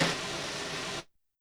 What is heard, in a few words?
Brush Swirl Long; YAMAHA RM1X